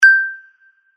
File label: ding